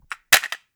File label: Cassette Rattle